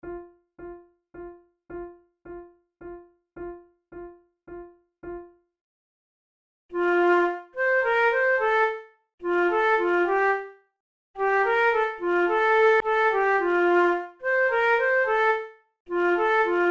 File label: methode de flute; tulou